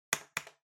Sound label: Trackpad Click Far L Hard Double